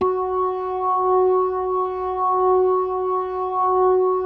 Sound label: F#4S, B3, Vintage Keyboards